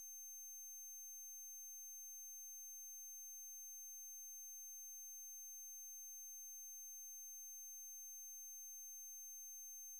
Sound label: 6300Hz; 9bit